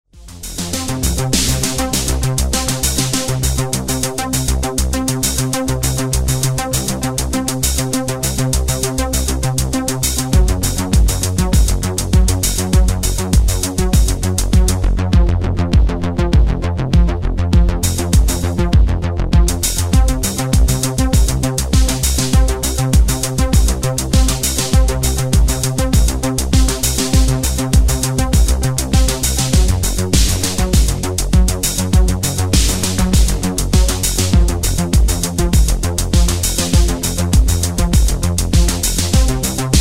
tr909

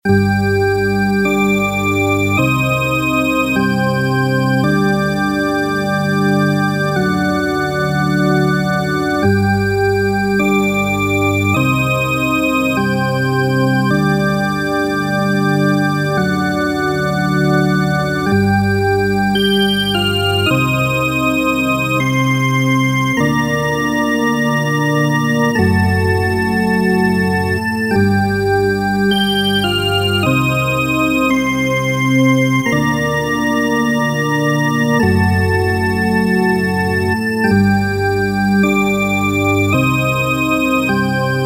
Casio SA-1 church organ

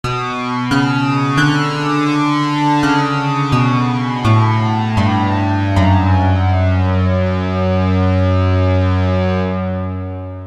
synthex laser harp

